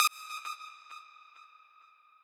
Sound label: lead